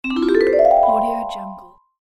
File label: cartoon vibraphone run up accent